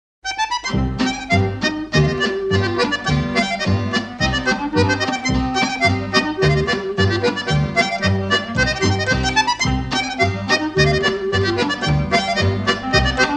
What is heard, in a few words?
polka